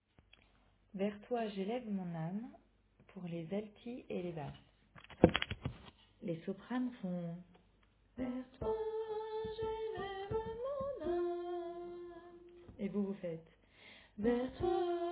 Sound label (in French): Basse